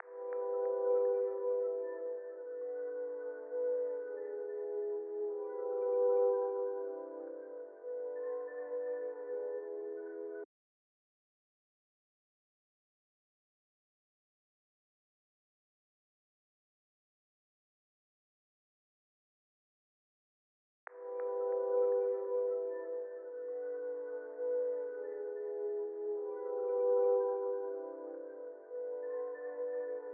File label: 92 BPM A Min Soft Pad; RnB; Cymatics; 2022 Melody Collection; RnB Pt 2; Loop Stems and MIDI